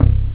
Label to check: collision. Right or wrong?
Wrong